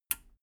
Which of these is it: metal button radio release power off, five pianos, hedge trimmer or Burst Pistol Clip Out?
metal button radio release power off